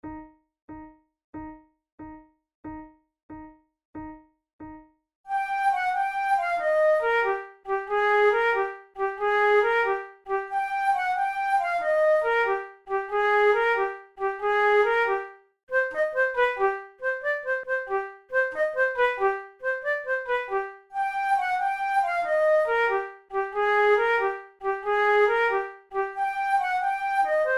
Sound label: methode de flute; tulou